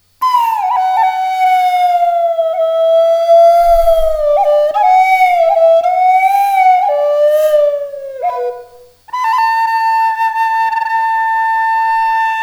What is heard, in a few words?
Bm Forest Microtone; Southern Cross Flutes